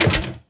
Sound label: bad part metal